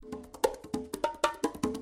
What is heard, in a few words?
Congas